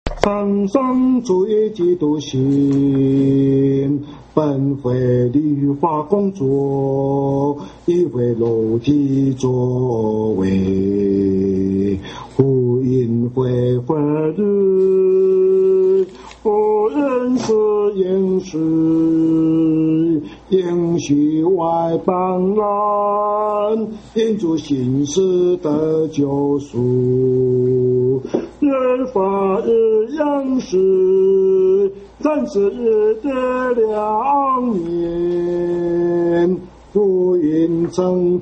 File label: hymn; worship